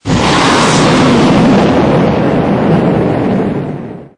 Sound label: rocket launch